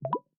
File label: Unlock; Honor X9c